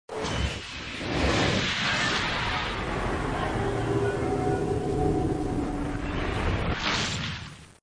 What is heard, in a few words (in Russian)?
samoletnoe pikirovanie